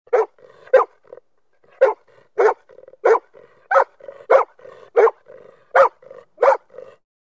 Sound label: golden retriever